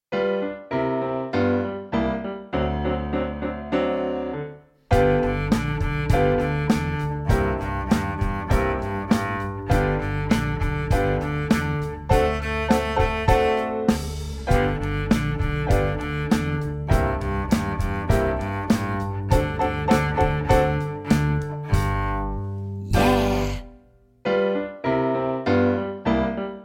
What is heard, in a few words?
violins boogie